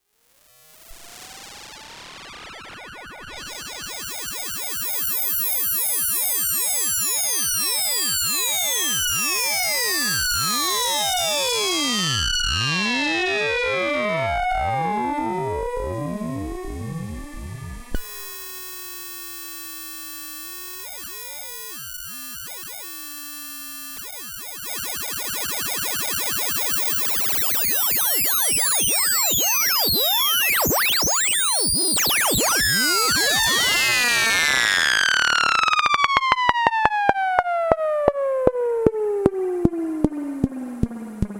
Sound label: Ringmod